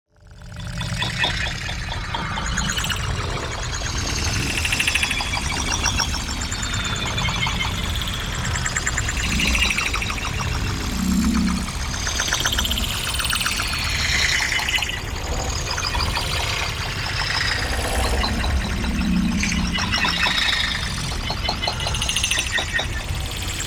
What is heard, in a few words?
PARTICLES LARGE SLOW; NEON; Time Laps; boom library